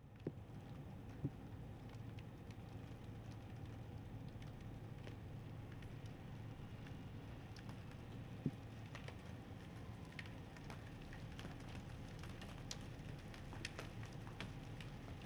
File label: Thunder and Ambiance; weathersounds